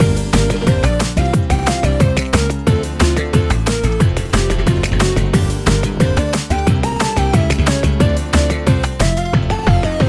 inspect idol music loop; MelodiQ (inspect)

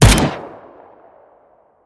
weapon; Default Pistol

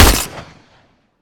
weapon; TGR-011

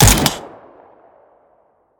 weapon; Ceretta 92; Pistol

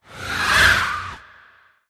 ghost tp; soul sanctum(old raid) ghost sound

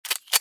reload; reload ended

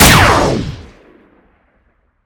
weapon; DES Tychnodyne

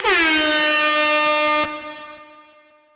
melee; airhorn

